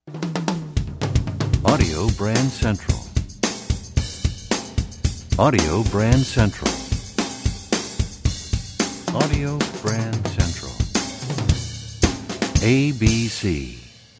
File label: Desert Wind, radio jingles